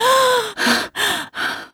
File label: Realistic Fatigue Voice, female tempo; oblivion